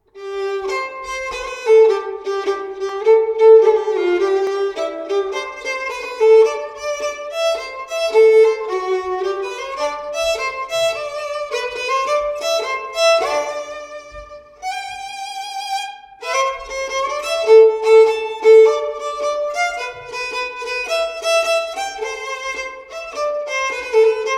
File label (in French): ronde; La gaite d Auvergne; ronde du Rival confident; Gretry 1788